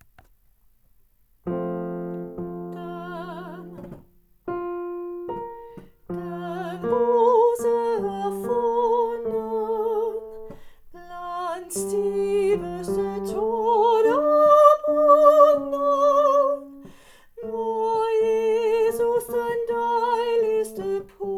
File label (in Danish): Den yndigste rose er funden TENOR